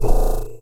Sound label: Old Springs